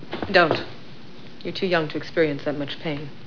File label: pain